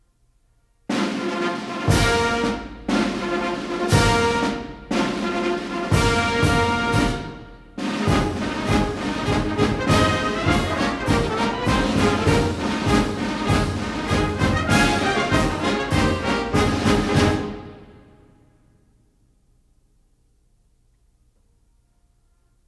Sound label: three ruffles and flourishes into flag officers march; Marine Corps Birthday Ball